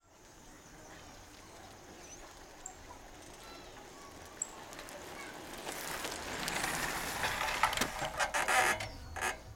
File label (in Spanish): BICICLETA ANTIGUA SE ACERCA Y FRENA EN PARQUE